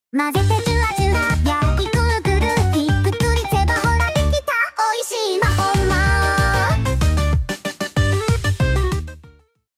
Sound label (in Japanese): フレンチポップワルツ